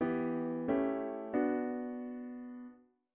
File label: diat V7 inv